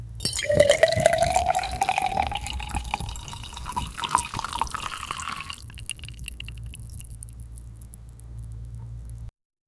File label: realistic sound of oil be, liquids